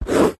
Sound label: office pen draw line short fast on card back of paper pad; zapsplat